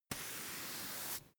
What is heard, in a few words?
broom hand sweep slow